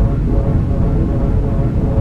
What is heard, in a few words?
drone; q3rally; world